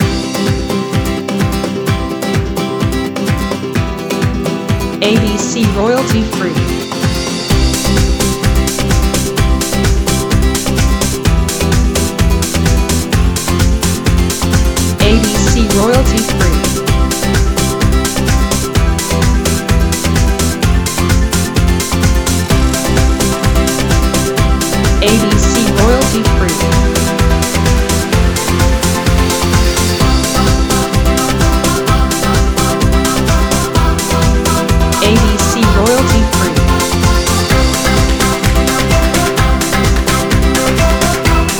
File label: Latino EDM